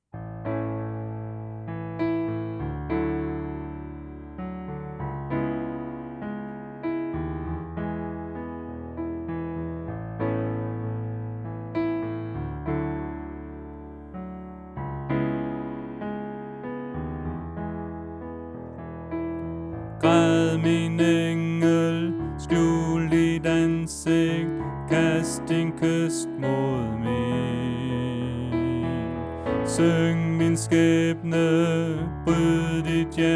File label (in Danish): Sopran